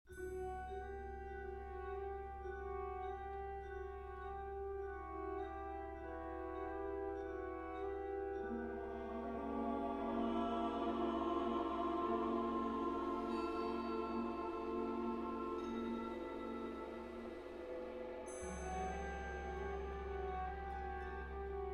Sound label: Chamber Choir; Tapiola Chamber Choir